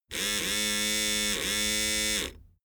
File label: servo shift